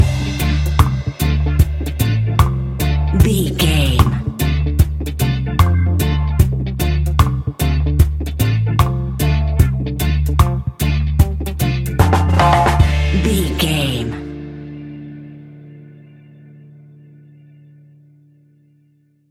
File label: groovy reggae music vibe, Classic reggae music with that skank bounce reggae feeling; Groovy Reggae Music Vibe. Classic reggae music with that skank bounce reggae feeling. Great for summer BBQ